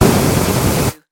Flame Thrower Blast; Action, Impacts & Explosions

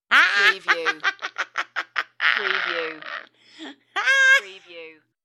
Woman Cracks Up

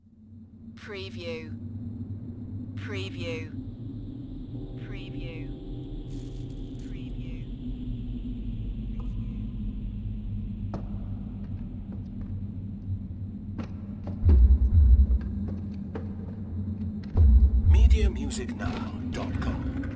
Fantasy Cemetary or Graveyard ambience

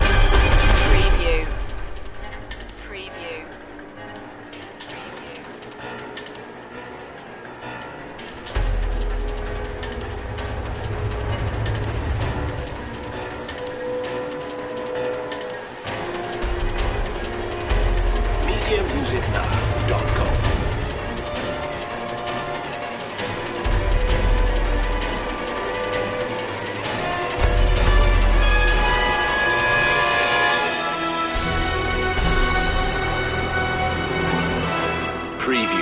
no choir

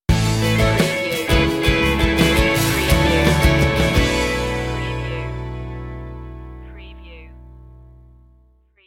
long ride home stinger

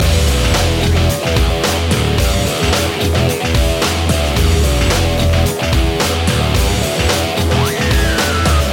beat loop

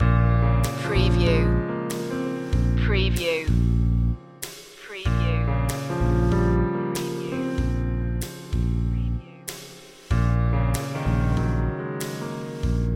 piano slow swing loop

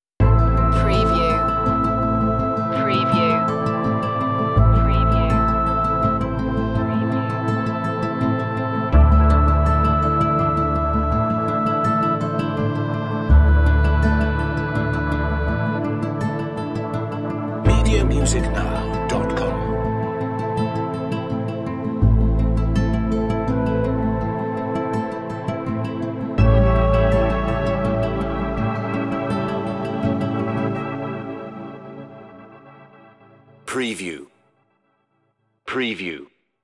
oboe in the woods

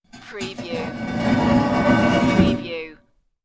Stone Door

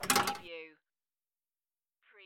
Pinball sound, rail or metal bar movements

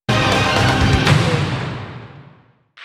beyond hell stinger

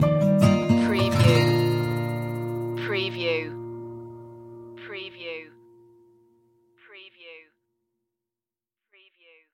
folk indie acoustic stinger

